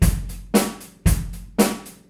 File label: Rock Beat Loop